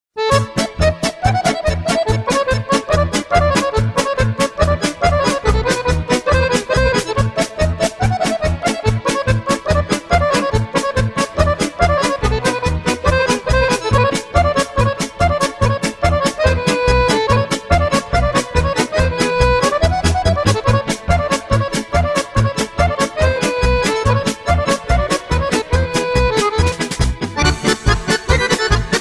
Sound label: Macedonian Tune